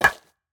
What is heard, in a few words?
Baseball Bat Drop Surface Gritty